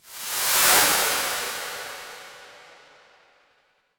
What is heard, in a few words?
magic scifi spell